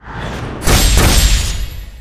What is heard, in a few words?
Combine Effect Sound